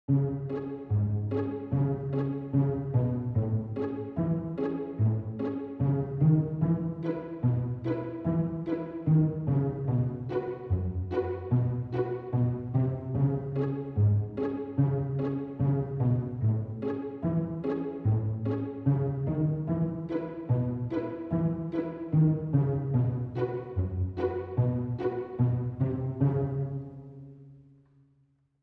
Strings Only

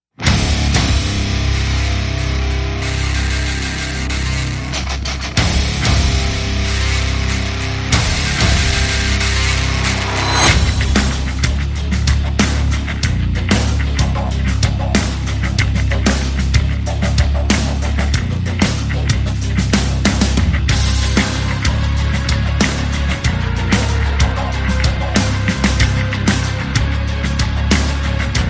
No Choir

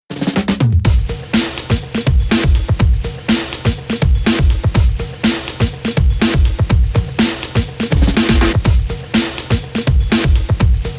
Oomph Beat

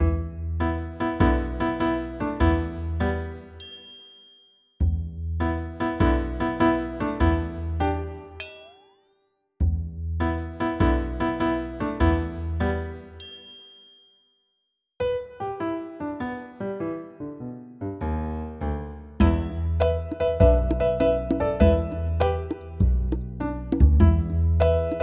Dance With Violin

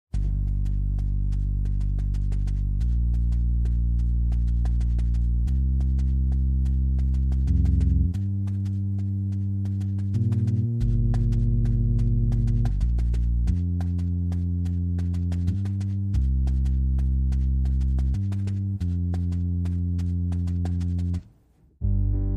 Drums and Bass

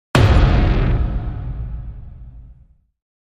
Hit

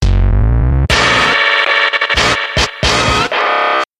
Twisted Noizes

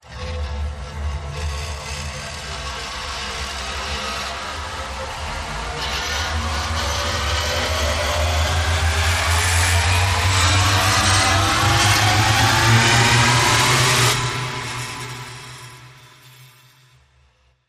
Rusty Starship Rise

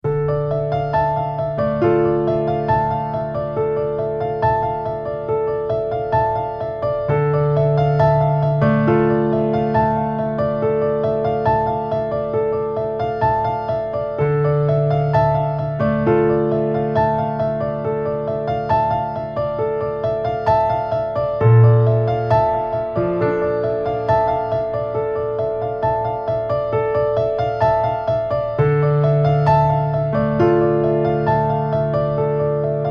Piano Intimate

